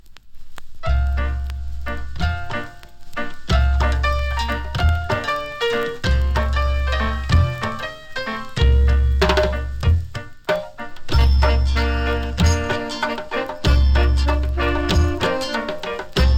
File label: soul flute part 1